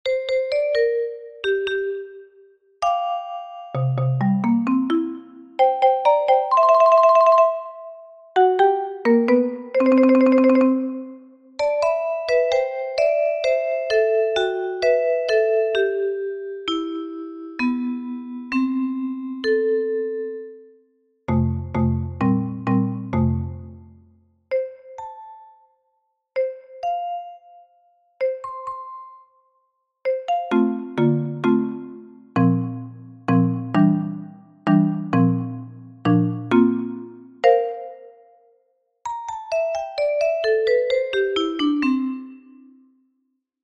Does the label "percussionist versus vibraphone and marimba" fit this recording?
Yes